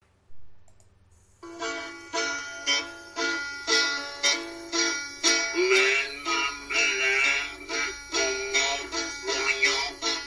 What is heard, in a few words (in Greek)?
morfonios tragoudi